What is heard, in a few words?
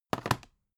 dvd case put down